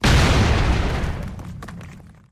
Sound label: Explosion close up c4 plastic debris; Explosions and Bomb; Explosions and Bomb Sound Effects